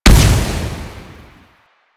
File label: Proj Impact; Duviri; Incarnon Adapters; Incarnon Dera Vandal; Weapons